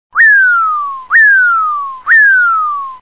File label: warning whistle